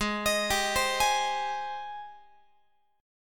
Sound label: G sharp Major 7th G sharp, Guitar; G#M7 Chord